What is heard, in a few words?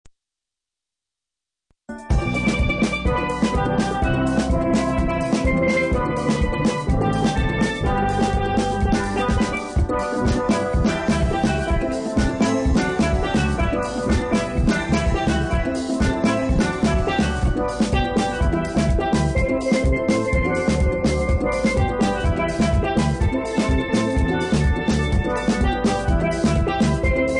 Acoustic Steel Drum Band; caribbean